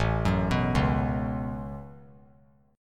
A 6th Add 9th A down, chord; A6add9; arpeggiated

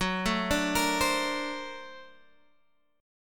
Bb Augmented Major 9th Gb

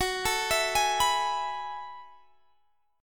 Gb Minor 11th Gb down; Gbm11; arpeggiated

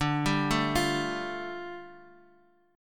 G 7th Suspended 2nd

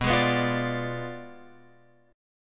B Diminished 7th, down, Guitar, Open F; Bdim7 strummed

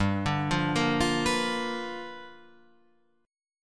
G 7th Sharp 9th G; 15,17,15,15,17,18,x,x; down

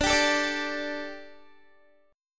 D Suspended 4th D, Guitar; Dsus4; strummed; 21,22,21,19,19,x

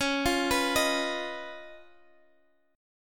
C sharp 9th C sharp, chord

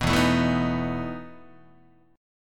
E Major 7th Suspended 4th E down Guitar

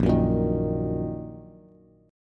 A sharp Suspended 4th Sharp 5th A sharp down Bass; strummed; JGuitar

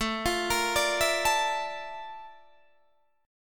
A sharp Major Sharp 11th, A

